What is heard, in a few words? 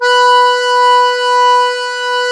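diatonic accordion B1; USB Soundscan vol.23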